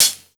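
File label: 94 Drum Sound, Pure Electro; USB Soundscan vol.02; Underground Hip Hop; AKAI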